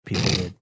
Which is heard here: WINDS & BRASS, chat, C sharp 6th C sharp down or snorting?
snorting